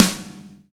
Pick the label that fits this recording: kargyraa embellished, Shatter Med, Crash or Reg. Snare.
Reg. Snare